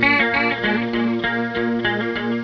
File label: swamp